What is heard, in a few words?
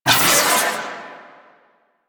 Proj Tap Whoosh; Warframe; The New War; Quests; Weapons; Omicrus